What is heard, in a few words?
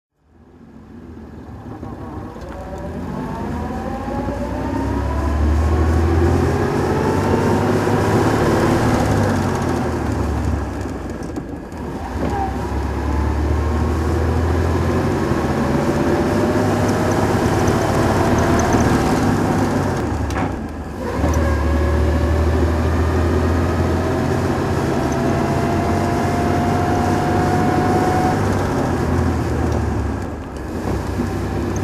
rolls royce phantom drive